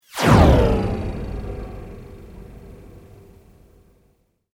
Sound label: Sweep Mechanical Medium